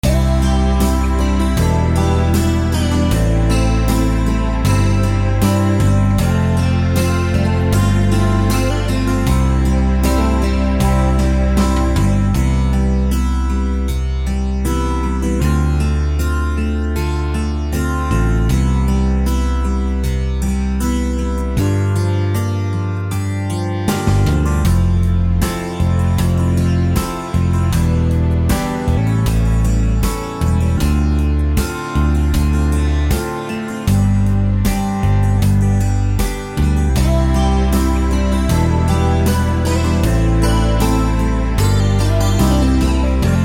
unplugged ballad

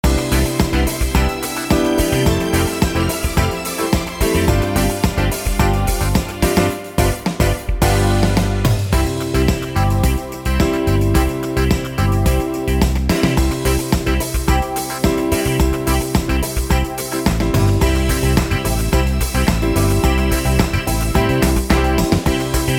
70s disco

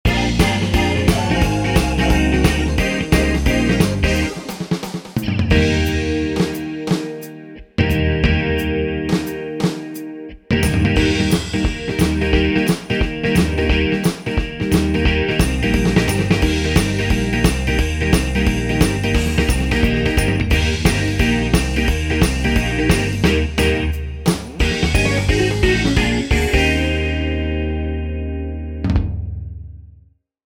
rock roll shuffle

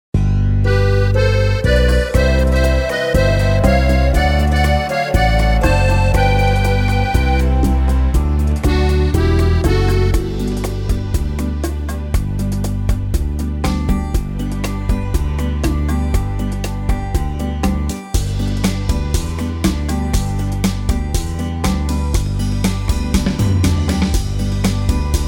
beguine

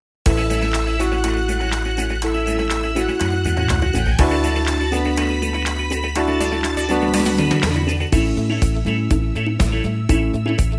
disco 70s

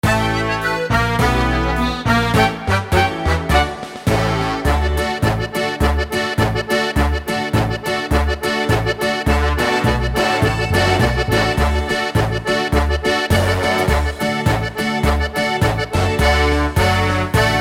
oberkrainer polka

